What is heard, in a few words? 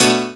b, Techno Piano Chords; Dance Synths